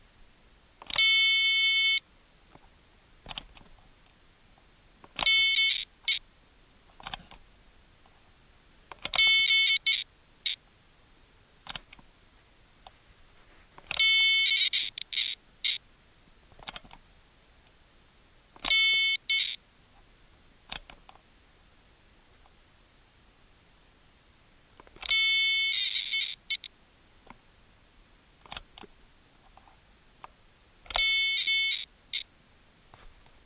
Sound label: Machine Dying Long